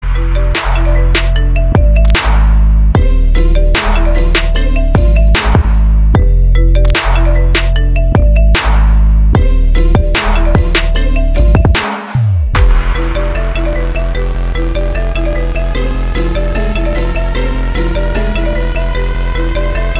Iphone X Trap

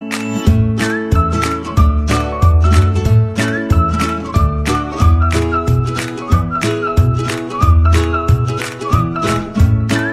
Happy Clappy

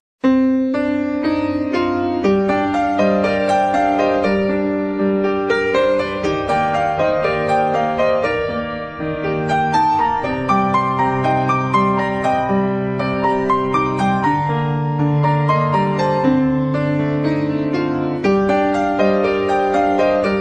Weather Alarm Clear

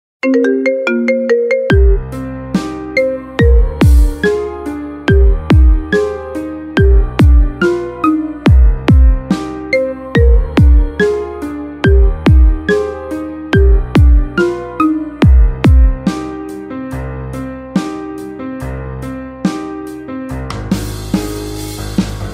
Marimba; Evil Morty

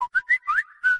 Whatsapp

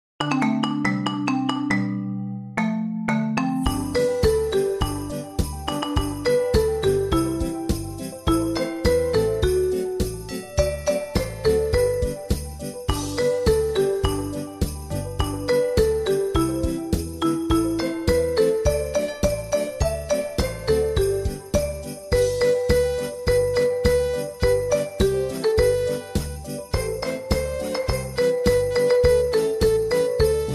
Jingle Bell Marimba

